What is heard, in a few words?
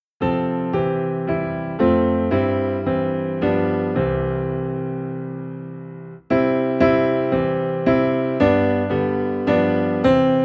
Piano - Low in C; Blest Be the Tie That Binds